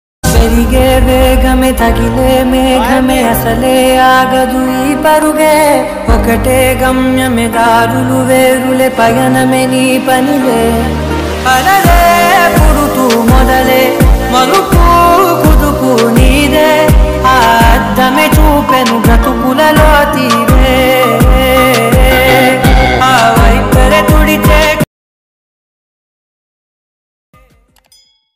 telugu song love; taxiwaala

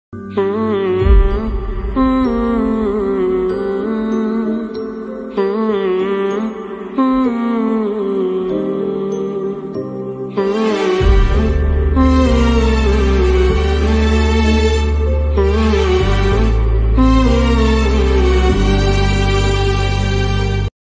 Pakistani drama ringtone; gentlemen

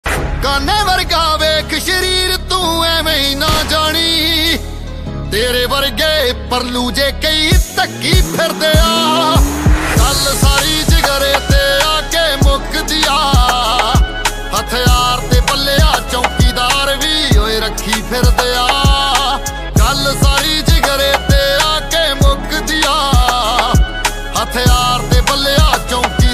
punjabi song ringtone

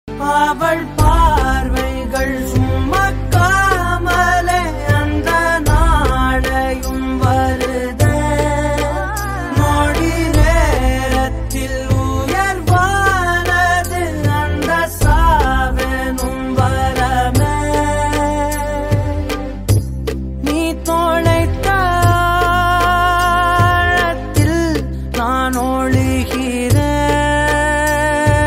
sad song; adithya varma movie

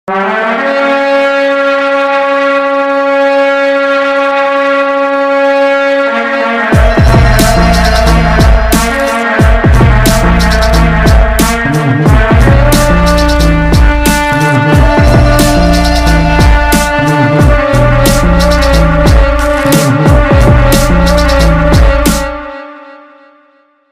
intro bgm; aavesham

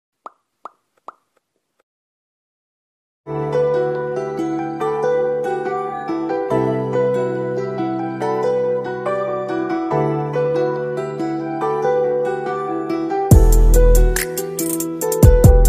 beats music ringtone

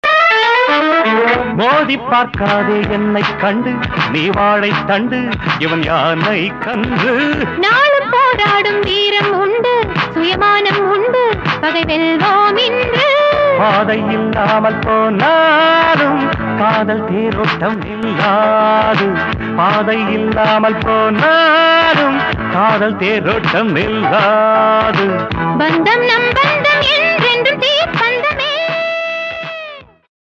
dance music tamil; kamal

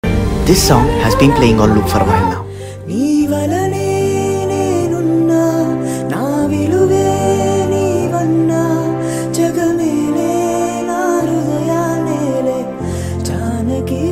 song cover, singer; adipurush

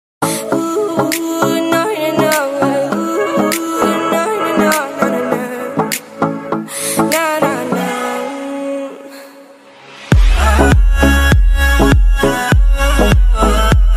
English ringtone music ringtone

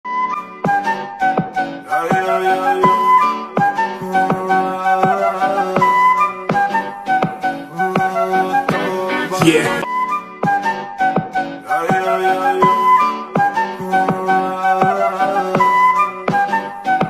instrumental ringtone instrumental mix ringtone

